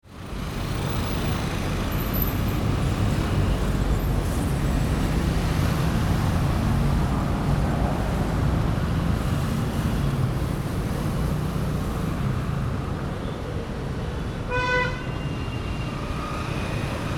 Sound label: City street ambience distant traffic cars passing by